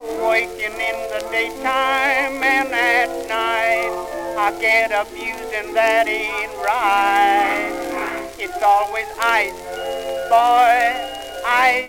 blues jukebox

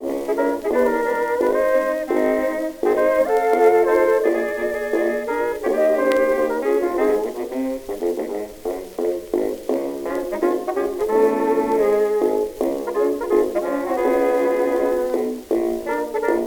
blues, jukebox

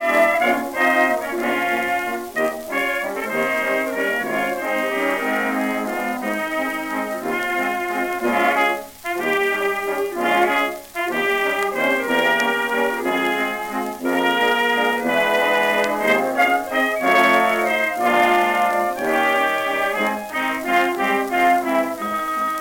waltz, jukebox, musical theater

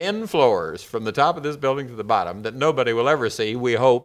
organ; Virgil Fox; reel; tony schwartz

